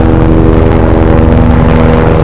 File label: biplane; WhiteOut; XBOX; trojan